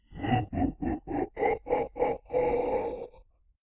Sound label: mobs dungeonmaster; server-nalc; mobs-master-TenPlus1